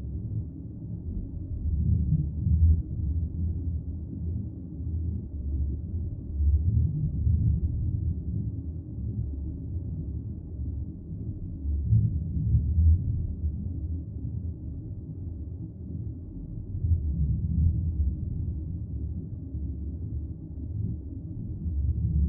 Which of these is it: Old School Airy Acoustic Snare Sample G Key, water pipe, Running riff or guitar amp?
water pipe